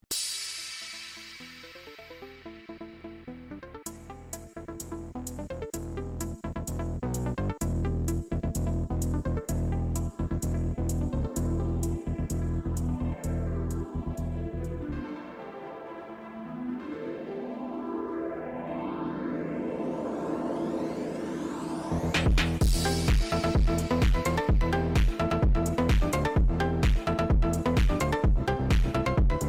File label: Clubstep Phonk Remix